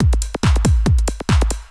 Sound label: TECHNO BEATS 140